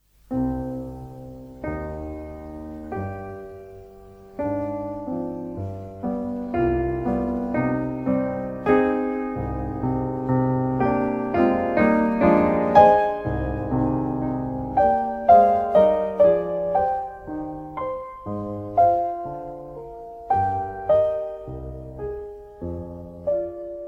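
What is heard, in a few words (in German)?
Klavierstücke Andante; Heinrich Schenker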